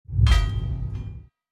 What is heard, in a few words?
Repair Shop Entry